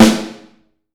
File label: Snare Drum